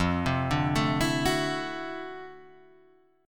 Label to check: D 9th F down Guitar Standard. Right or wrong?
Right